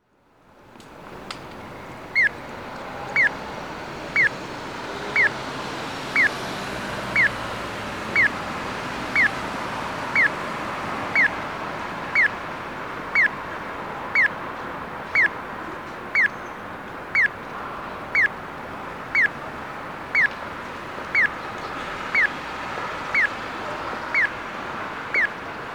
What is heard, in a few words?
piyo, onkyo kosaten; aichi; banshojihigashi; nagoya U-TP-1; naka